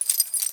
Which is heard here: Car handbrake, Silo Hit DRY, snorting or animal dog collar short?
animal dog collar short